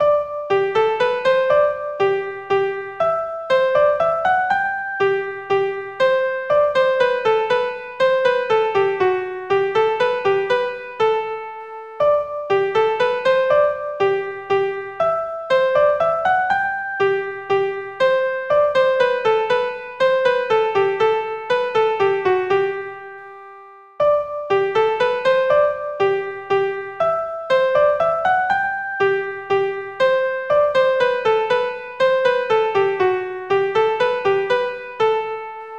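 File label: Minuet In G - r.h. only, piano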